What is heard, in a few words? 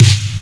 Snares; Vengeance Samples; VEH2